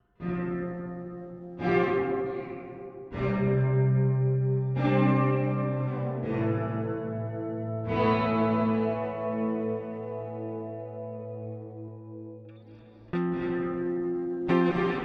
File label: Spring; Golden Reverberator